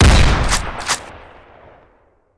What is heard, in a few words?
tango 51 fire, weapons